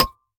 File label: Candy Fall; Candy Crush Soda